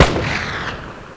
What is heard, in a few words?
Grenade (Low); Souls in the System Demo; SS Sounds (High)